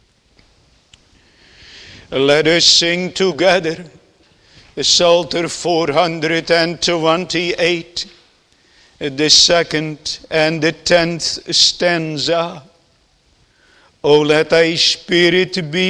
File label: Singing